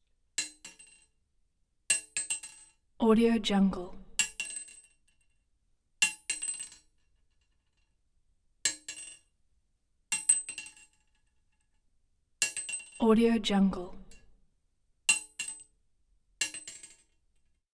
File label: ammo shell drop 38 cartridges ejected; prosoundfx